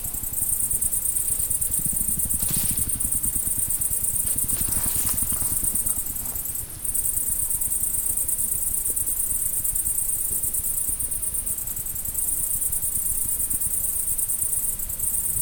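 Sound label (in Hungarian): összegombolyodva, európai sün; termeszetben; allathangok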